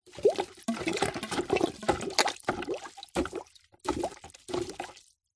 industrial pump hand plastic small pumping water plastic bucket